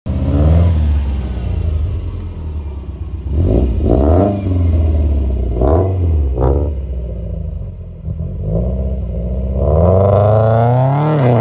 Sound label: subaru